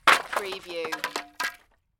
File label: Wood Junk Crash